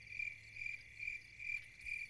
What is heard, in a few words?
Crickets, ambience